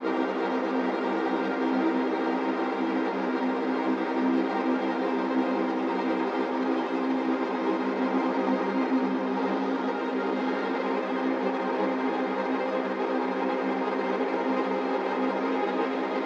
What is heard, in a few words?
ATMOSPHERE 175; KULTURE